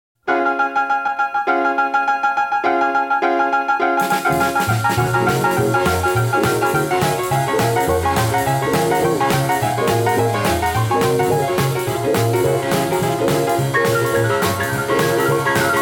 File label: Stomp (Instrumental)